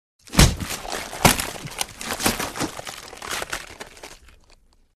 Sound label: Impact Bone Crunch; Hollywood Edge Foley Sound Library